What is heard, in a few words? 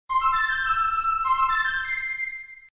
Message fantasia